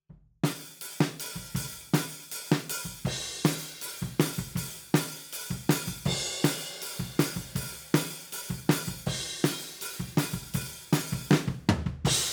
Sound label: Overheads, 160 BPM